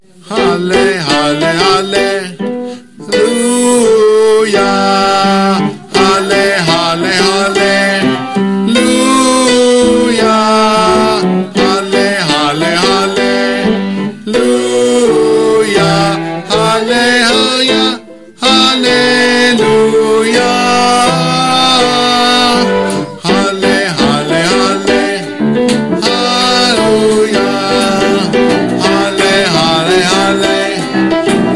Halle Halle Halleluia Altos; choir

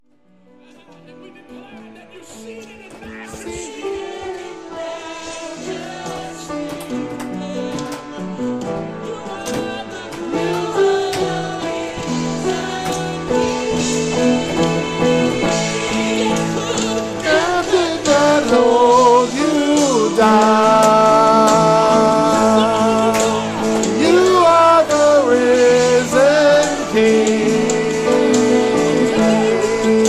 Anthem Tenors; choir

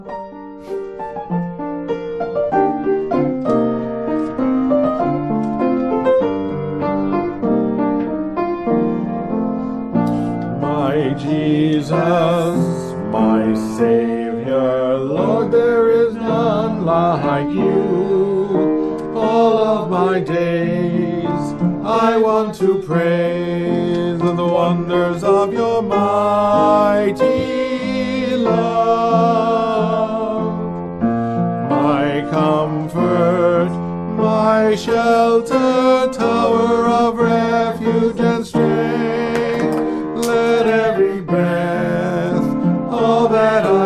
Tenor; choir

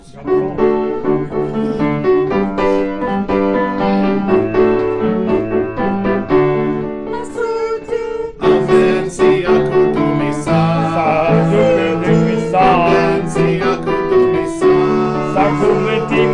Alto; Amen Siakudumisa; choir

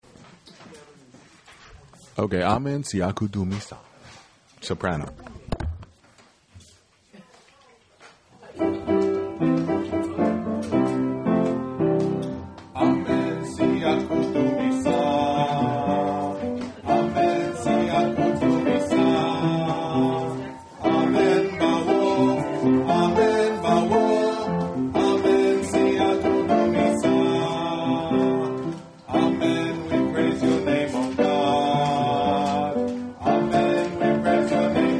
Amen Siakudumisa Soprano

